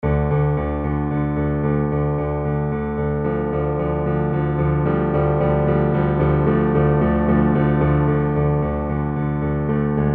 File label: Piano; xenosaga2